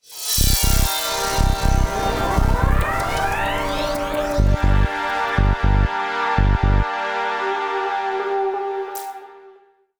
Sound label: Music Respawn